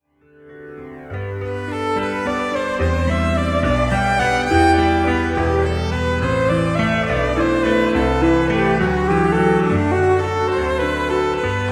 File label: Violin and Keys; Wedding Ceremony Music; Jesu Joy of Man's Desiring